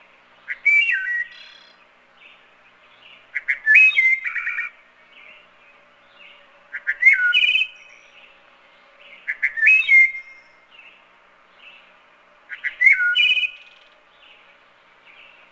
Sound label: birds; leisure suit larry magna cum laude